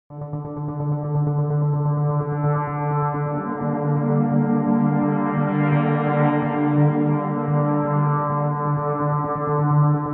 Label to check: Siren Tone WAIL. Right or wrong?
Wrong